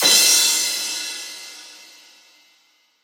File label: Crash; Cymbals; Ghosthack; Color Bass Essentials